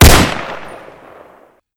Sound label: PSG1 fire; STALZONE